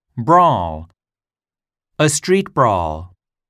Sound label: brawl